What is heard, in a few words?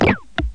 backpack used up